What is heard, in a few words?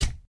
heavy taunt chest hit